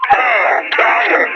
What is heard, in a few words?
Enemy Pain; Warframe; Lasrian; Battle Barks